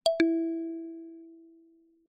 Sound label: notification